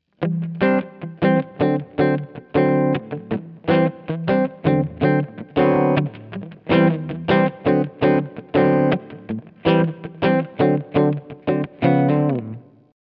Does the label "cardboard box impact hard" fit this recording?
No